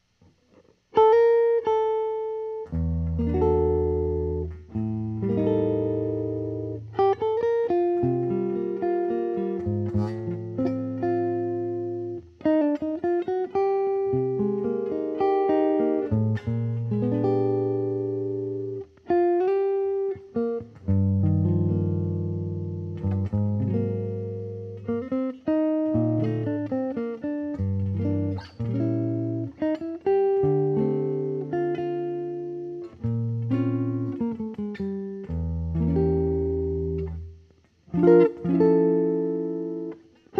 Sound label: Amati Amp Amati Bridge, guitars